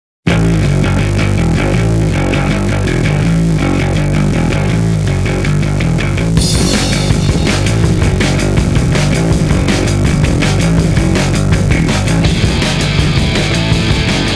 Off Vocal Version; Furi Kuri; FLCL

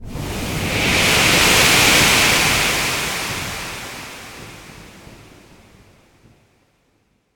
Power-Up Stinger

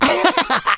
Emote Laugh; the princess and the frog; Target Hungryfrogs

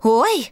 Diner Emote; Light Street

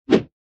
Sfx Lancer Attack

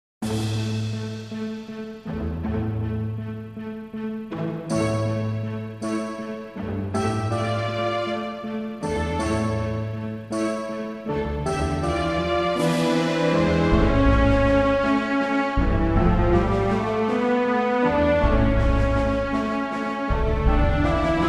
quiet loop; Indiana Jones and the Infernal Machine

